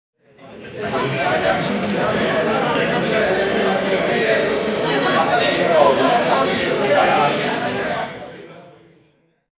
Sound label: sounds of merriment in a, tavern